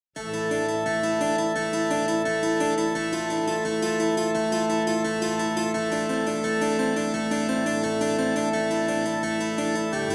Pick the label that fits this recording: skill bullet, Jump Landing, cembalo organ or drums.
cembalo organ